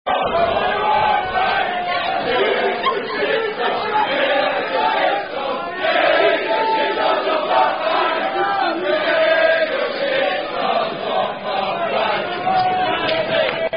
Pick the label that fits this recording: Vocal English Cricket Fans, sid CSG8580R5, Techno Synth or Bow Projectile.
Vocal English Cricket Fans